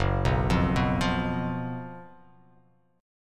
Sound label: Gb Major 7th Suspended 4th Gb, guitar; GbM7sus4; arpeggiated; 2,2,3,4,5,x,x,x; JGuitar